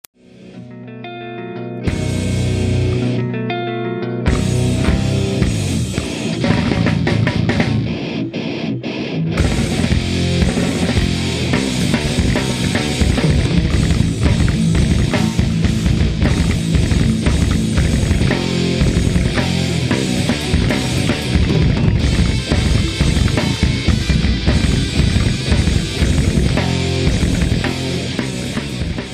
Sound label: Metal 1